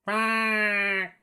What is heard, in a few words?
Fark bird; SyrinScape; Syrinscape unplugged; Jungleplanet dinolaser battle